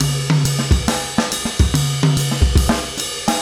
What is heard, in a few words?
trip jazz 140